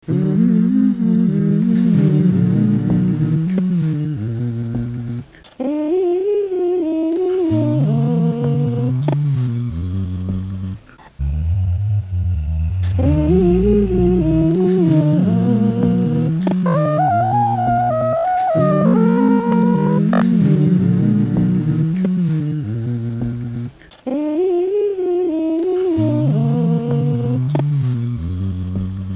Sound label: Humming Reprise